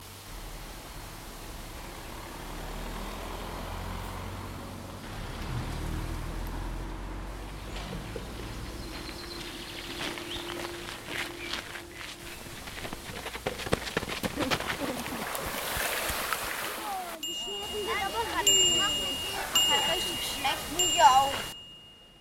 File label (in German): Klangcollage Friedrichsplatz Marburg